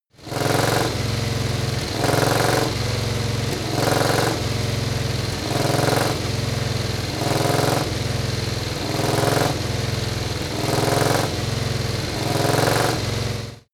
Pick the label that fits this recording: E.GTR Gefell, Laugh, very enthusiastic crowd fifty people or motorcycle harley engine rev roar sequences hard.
motorcycle harley engine rev roar sequences hard